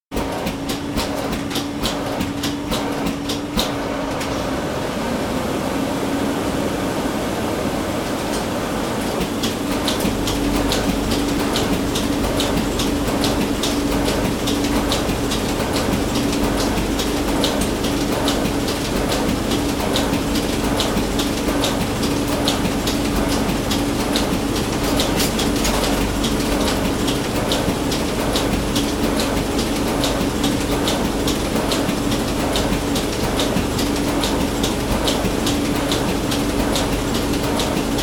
Large Collator Copier, start, run & stop; 1975 onward; Miscellaneous Office; BBC

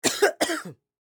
Human Male Cough; Sick Sound Effects; Sick (Sound Effects:Human)

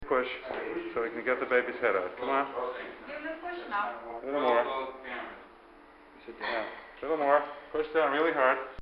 Childbirth, hospital; Hospitals

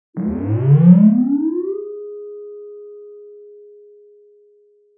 Siren Sound; Rollover or button sound; Multimedia

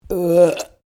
Burp female medium, Human Burping

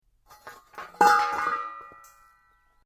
METAL OBJECT CRASH; Metal Sound Effects; Metal (Sound Effects:Materials)

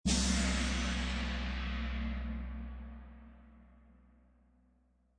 orch cymbal hit, Orchestral cymbal hit.; Percussion Loops

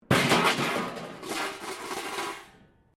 metal rubbish bin lid smash concrete

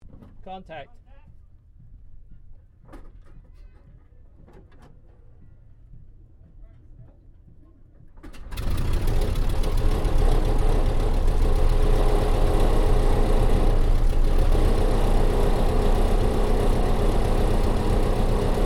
AIRCRAFT RYAN PT22 STARTUP INTERIOR; Airplanes; Vehicles; 1942

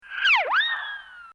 Comedy Effect Slide Ricochet; Funny Sounds

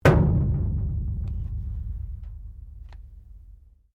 METAL IMPACT ECHOE; Metal Sound Effects; Metal (Sound Effects:Materials)

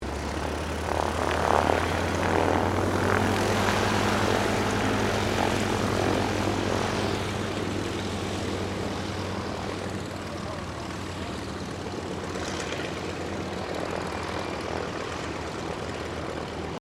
AIRCRAFT CAC CA-25 WINJEEL TAXI, fighter trainer; RAAF Winjeel fighter trainer; Airplanes; Vehicles

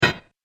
Impact Hit Deep Clunk; Miscellaneous Impact Sound Effects; Andrew Fleming

